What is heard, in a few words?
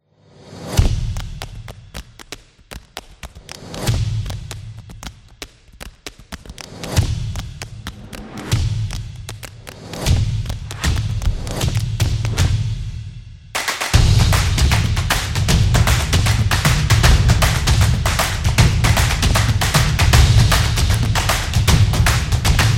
Fashion Sport; fast tempo